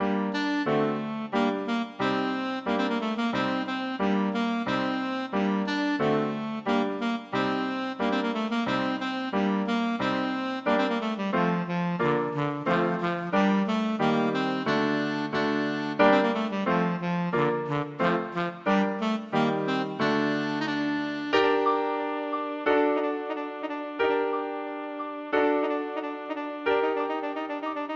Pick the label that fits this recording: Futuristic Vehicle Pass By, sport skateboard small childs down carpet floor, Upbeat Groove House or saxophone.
saxophone